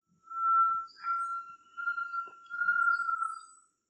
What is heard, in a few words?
tinamus major, Aves; Gandoca-Manzanillo; historia natural; Galería Biodiversidad